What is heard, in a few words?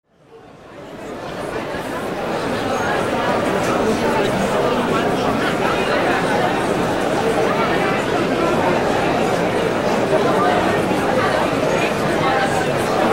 crowd ambience